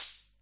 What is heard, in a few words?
hho, Hammond Auto Vari 64; 1974